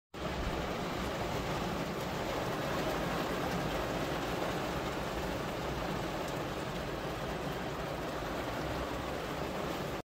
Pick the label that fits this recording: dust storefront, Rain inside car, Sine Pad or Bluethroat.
Rain inside car